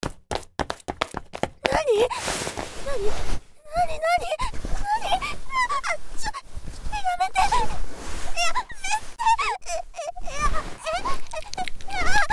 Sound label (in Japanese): 乱入; 効果音あり